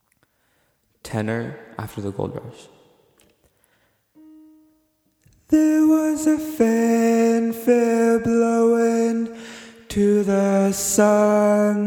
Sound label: tenor